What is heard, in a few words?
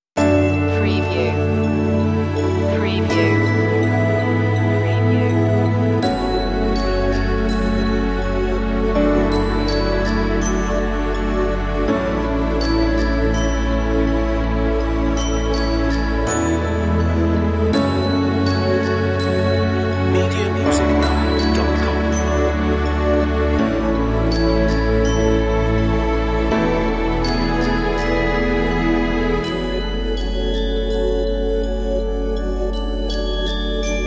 true reflections bell lead